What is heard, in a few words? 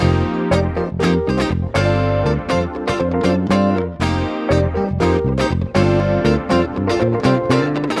Backing, Nu Disco, 120bpm Cm; Zero-G; Construction Kits; Legacy